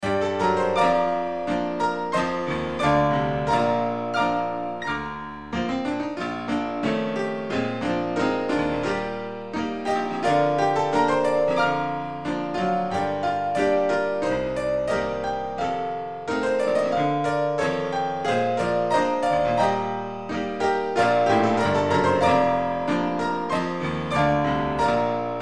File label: there is a fountain, instrumental hymns